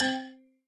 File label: Combo Sound; Candy Crush Soda